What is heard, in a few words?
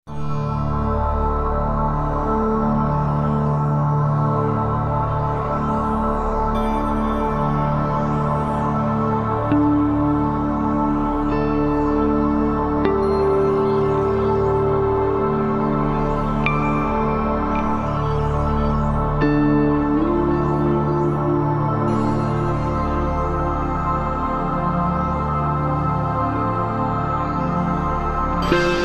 Desert; Nancy Drew Mobile Mysteries: Shadow Ranch